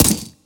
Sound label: machinegun